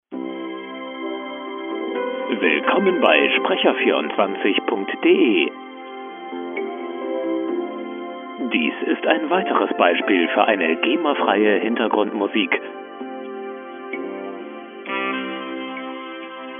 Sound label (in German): musik